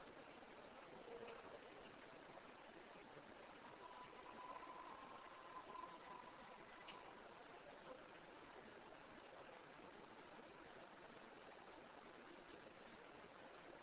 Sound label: Possible Scary Woman Screaming; This one is disputed, due to recording location, but is eerie nonetheless; Original x1 Amplified and Cleaned x2; Bisbee/Tombstone